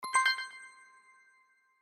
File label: unlock new item; mr and mrs creativerse